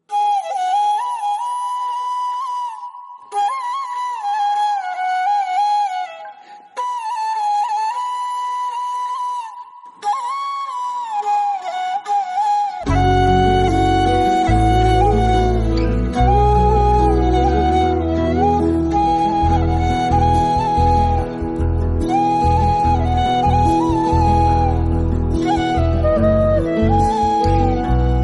Instrumental Ringtone

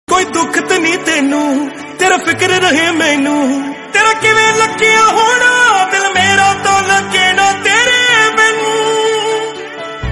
Punjabi Song Ringtone

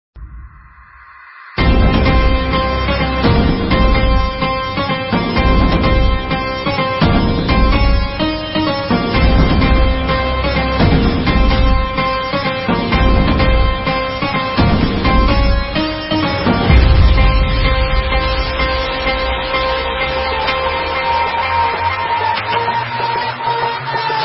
Bgm Remix Ringtone; KGF

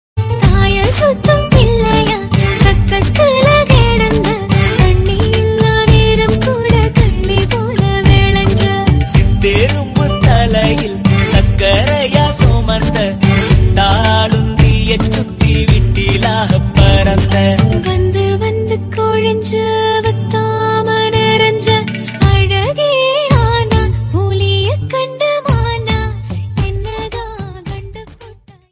Female Ringtone; Kalavani 2

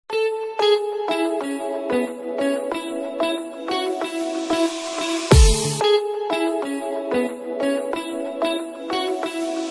Love Feel Ringtone; Telugu Movie Saaho Teaser

